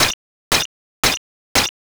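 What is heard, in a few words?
footsteps wet road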